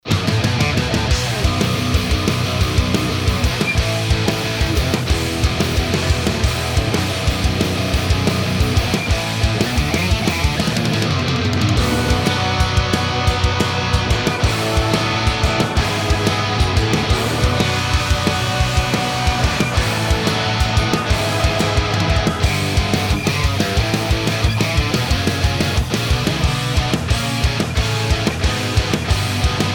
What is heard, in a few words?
MIX SAVAGE